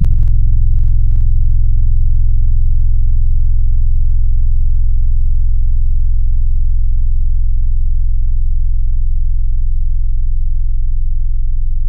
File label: Lofi Rhodes High; Synthetic's Serum Collection; Surge Sounds - ASTRO - Serum Wavetables